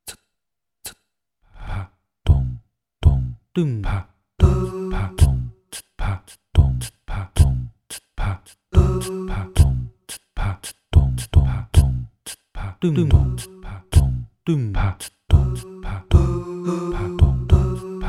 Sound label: Alesis DM 10 MkII Pro Kit Voices